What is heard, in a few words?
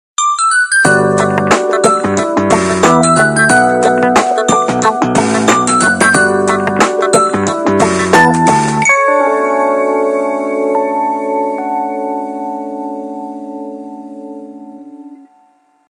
Soulful Nokia 1112 Tone